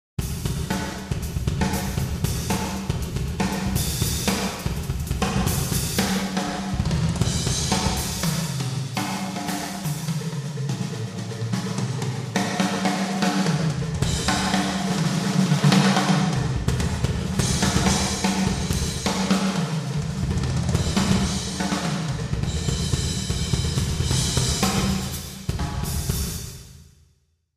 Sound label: solo